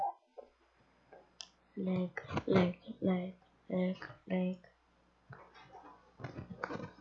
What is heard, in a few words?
laugh